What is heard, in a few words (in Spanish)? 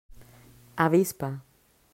avispa; mausschool